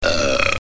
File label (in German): ruelpsen